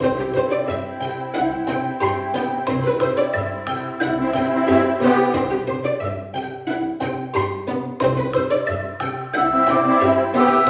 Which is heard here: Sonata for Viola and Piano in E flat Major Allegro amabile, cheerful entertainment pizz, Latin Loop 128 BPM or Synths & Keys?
cheerful entertainment pizz